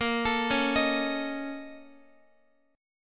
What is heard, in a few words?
A sharp Minor Major 11th, down, Guitar; arpeggiated; 4F,4A,5C,5G,5C,6E; JGuitar